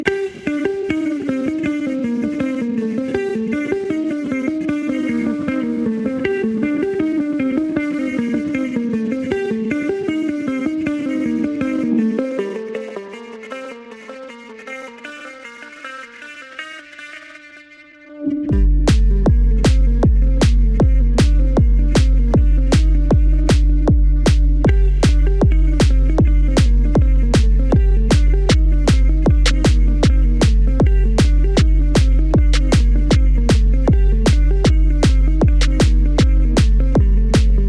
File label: Acid Noise Live Set Tekno 156 Bpm